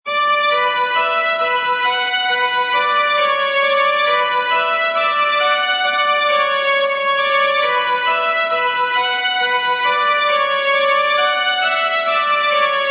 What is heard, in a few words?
Horr FADE OT Violin Bm, Fadeout violin horror loop in B minor at 135 bpm.